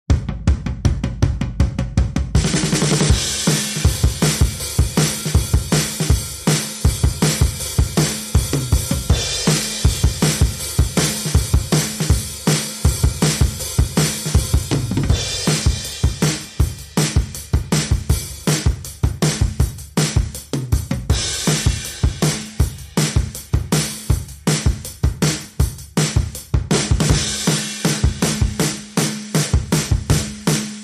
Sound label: Drums Only